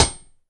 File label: node dug; logistica